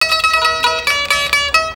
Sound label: TAMB, Gipsy; Best Service; Ethnic Journey Sound Cube